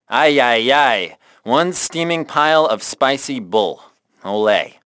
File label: band